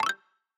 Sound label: Candy Spawn Noise